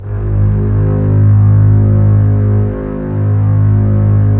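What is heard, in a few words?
STR CBS, Strings; Roland - LA Composer vol. 4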